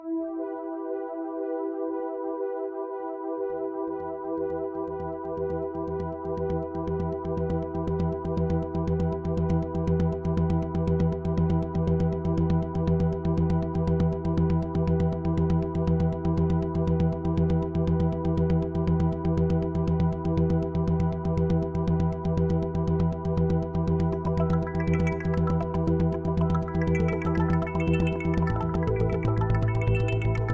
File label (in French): Instrumental